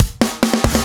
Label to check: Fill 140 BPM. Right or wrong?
Right